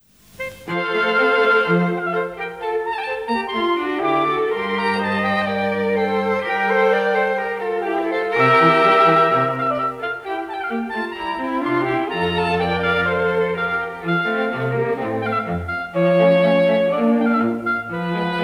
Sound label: Oboe Quartet; Mozart